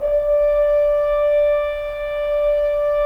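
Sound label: Solo French Horn, Brass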